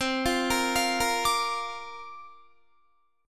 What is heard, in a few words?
C 7th Suspended 2nd Suspended 4th, C, down, Guitar; C7sus2sus4; arpeggiated; 4F,4A,5C,5G,5C,6E